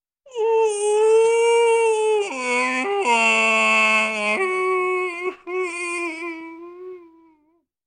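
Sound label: whimsical howling of a ghost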